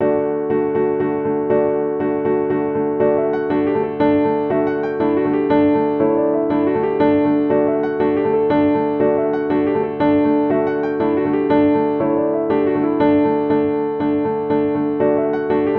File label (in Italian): Danza in re piano